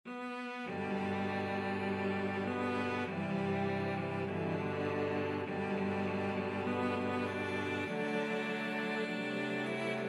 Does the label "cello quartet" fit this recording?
Yes